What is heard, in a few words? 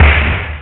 SNARE